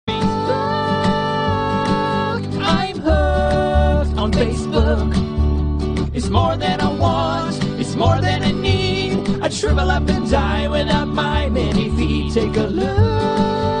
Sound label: Facebook Tone